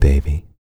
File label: sultry, vocal, hip-hop; TB HIP-HOP